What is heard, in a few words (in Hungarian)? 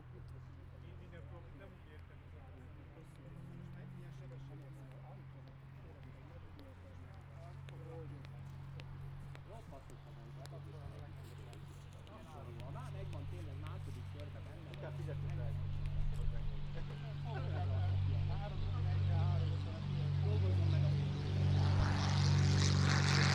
jobb oldalt elhuzo, egymotoros repulo, repter; budaorsi repter